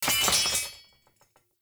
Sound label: Short Sword Drop; Weapons; Enemy; Swords; Sinecure Short Sword